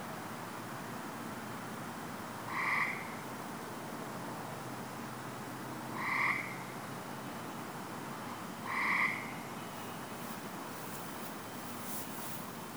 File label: gray tree frog